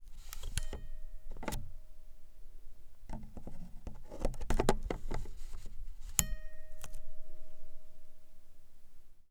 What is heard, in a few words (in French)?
DEMONTAGE MONTAGE OBJECTIF